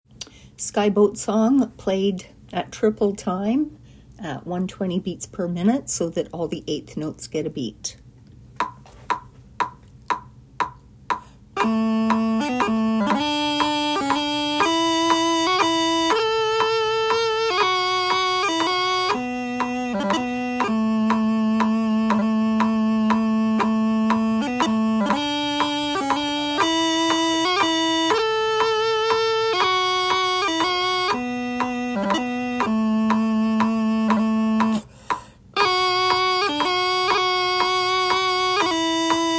Skye Boat Song, pipers

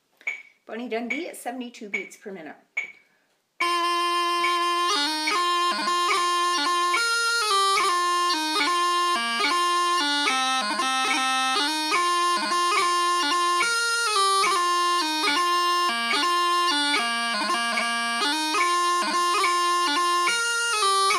Bonnie Dundee 72 Bpm, pipers

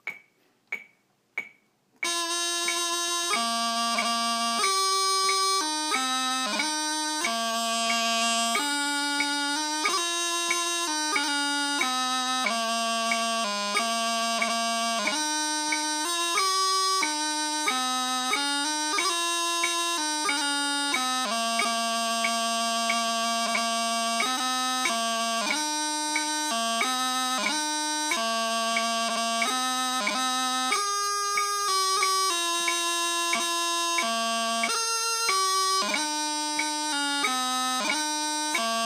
The maple leaf forever, pipers

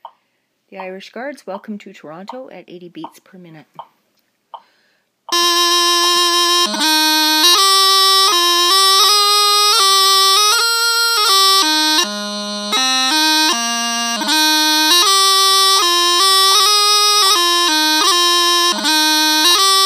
Irish Guards, pipers

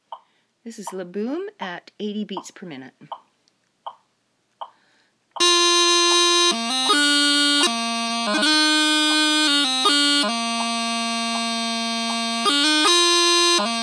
La Boum 80bpm, pipers

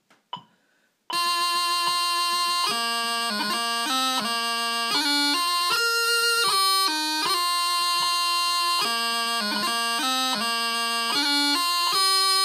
Murdos Wedding, pipers

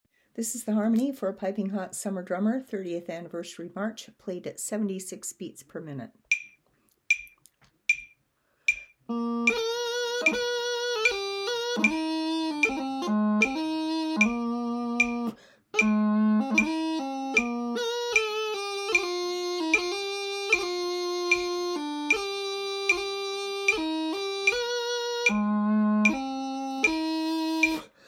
March Harmony, pipers

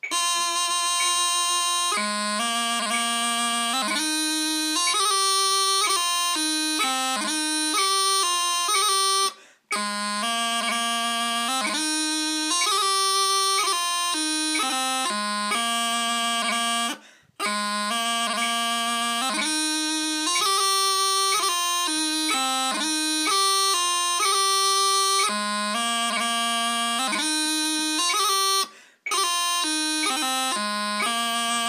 Mairis Wedding, pipers

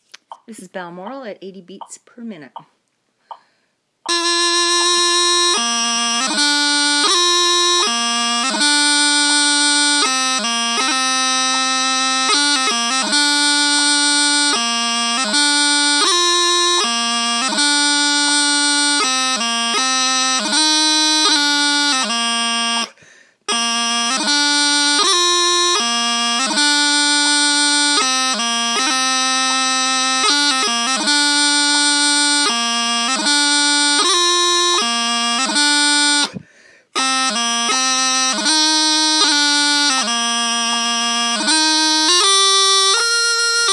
Balmoral, pipers

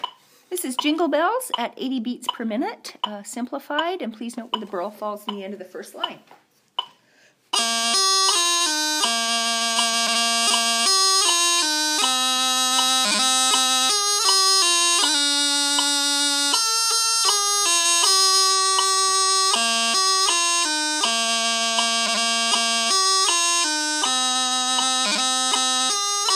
Jingle Bells, pipers

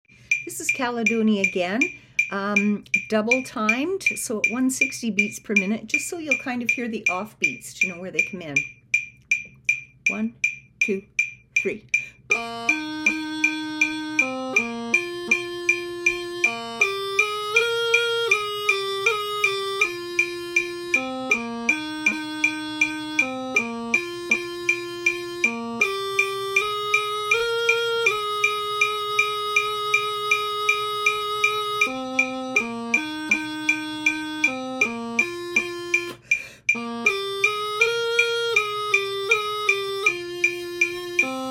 Melody Double Timed, pipers

